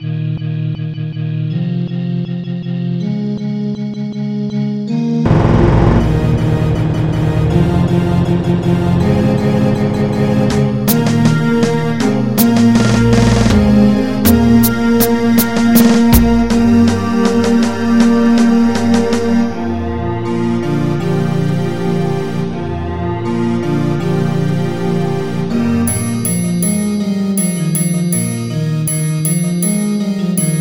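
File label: Remix to Fanfare, MIDI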